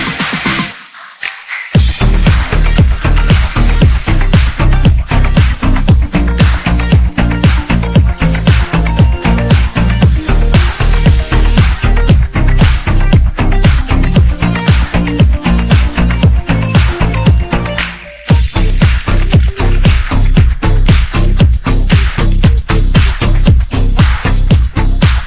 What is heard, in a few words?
English ringtone